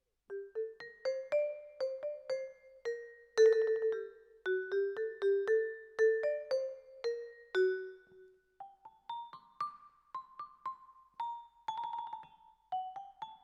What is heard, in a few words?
Vibra Close